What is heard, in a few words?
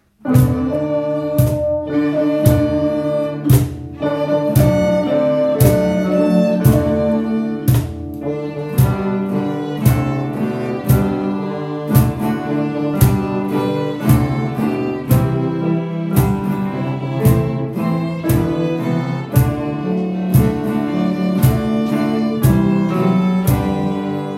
Jingle Bells